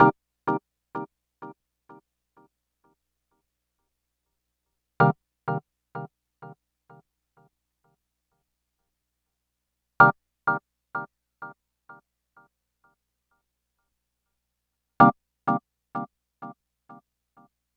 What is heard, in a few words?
Organ Echos; Dr. Fink's Funk Factory